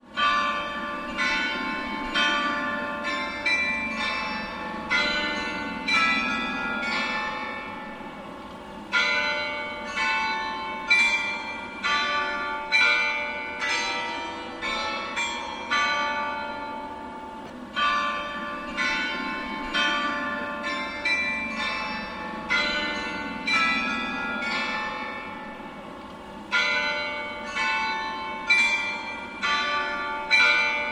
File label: carillon